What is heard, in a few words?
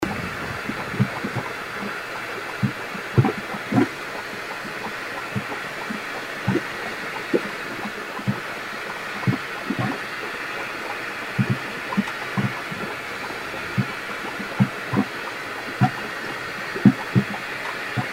Yellowstone Paint Pots